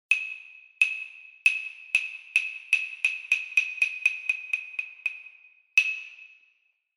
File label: Hyoshigi